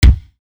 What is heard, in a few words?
roomy thump hit; industrial sounds